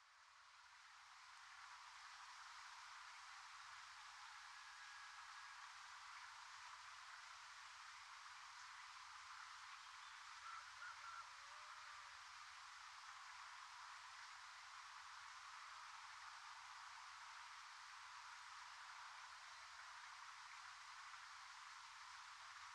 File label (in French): Eveil des oiseaux Bois; Bois du Papa-St Didier(F)